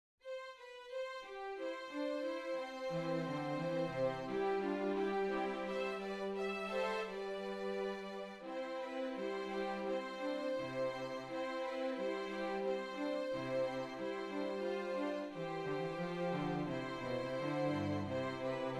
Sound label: Silver Nail Strings